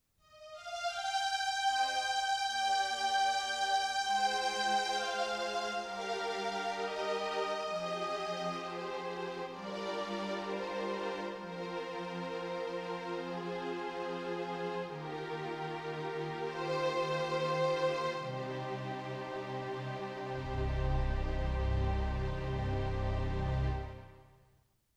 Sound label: Strings